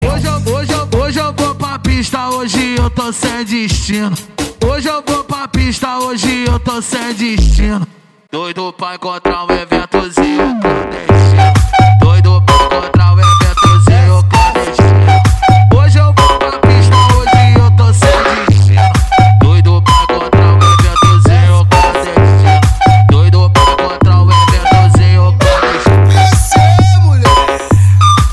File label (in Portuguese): eletro funk automotivo